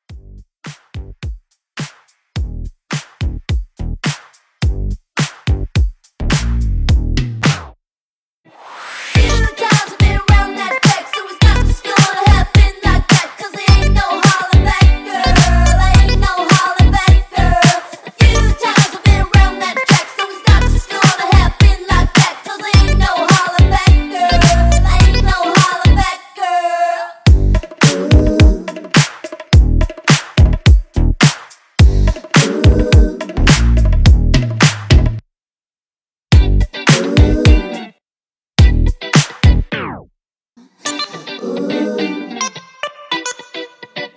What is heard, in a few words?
Mashup 106 Clean